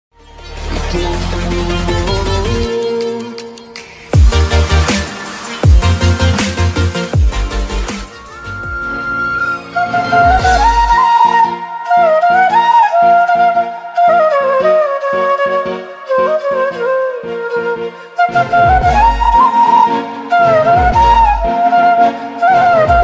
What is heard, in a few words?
instrumental bgm